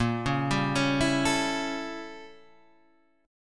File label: A 7th A sharp; arpeggiated; JGuitar